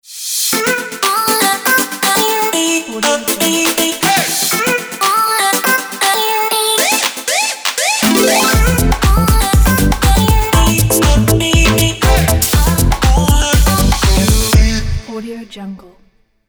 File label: positive logo intro; victormusic